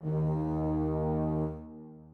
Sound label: strings